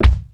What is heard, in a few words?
BD BLUBB, bass drum; BASSDRUMS